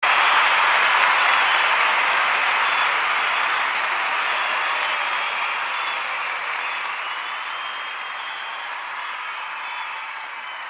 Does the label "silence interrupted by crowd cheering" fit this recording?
Yes